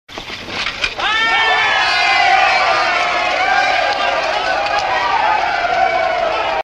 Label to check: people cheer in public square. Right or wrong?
Right